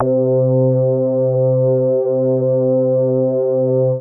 C3, BASS; Q Up Arts; Dream Experience

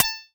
PRC, Yamaha TX7 synth drums

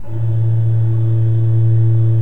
KOPPELFLUTE A1, Cathedral Organ; Propeller Island

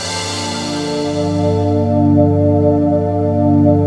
FLUTE L; Sound Gallery - Stack Ware

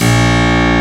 HARPSICH

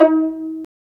strings pizz, violas pizz; Roland LCDP13 String Sections; STR Vas Pizz

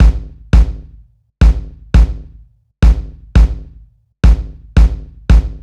Kick, Kick Loops; KJ Sawka - Drum n Bass & Beyond; Drum Tool Box

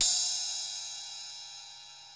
RIDE, DRUMS; Crypton - DrumBase5000

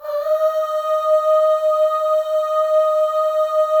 AHS, FEM; Spectrasonics; FEM.2 AHS

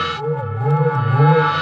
Void Perc; The Void; Processed Drumkits

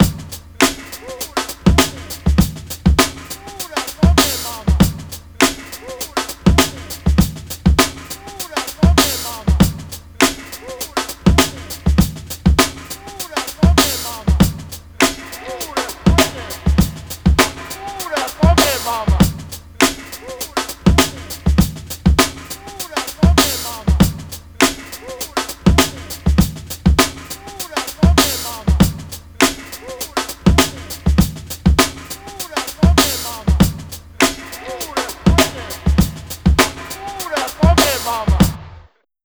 100 Funky Mama, breaks; Lockdown Breaks

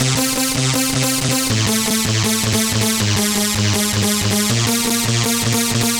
Dance Synths, Hardcore Loops, Synth Loops; Best Service ProSamples vol.37

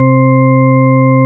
VOXISH ORGAN; Zero-G; Funky Elements

